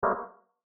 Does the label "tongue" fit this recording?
No